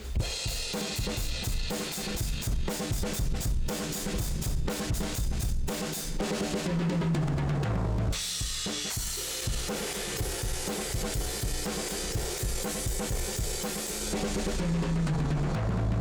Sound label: drums Drum Room Smash; Pulsar 1178